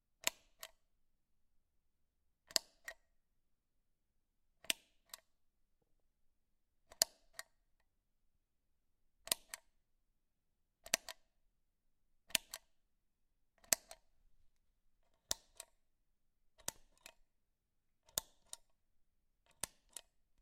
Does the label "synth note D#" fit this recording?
No